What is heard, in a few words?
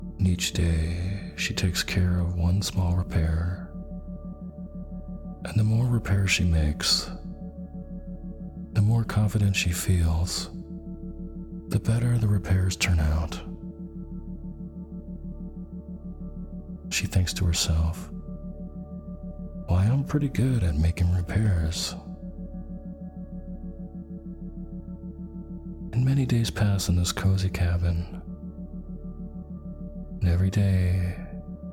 Isochronic Tones; Magical Island Full